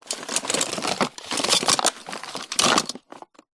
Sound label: household tub small containing odds and ends rummage; zapsplat